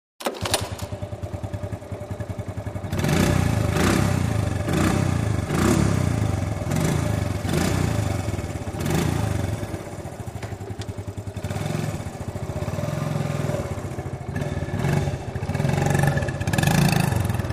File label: Motorcycle; Start / Circles; Honda Trike Start Up And Goes Round Wall Of Death, Finally Slows With Brake Squeak And Track Cuts.; trials bike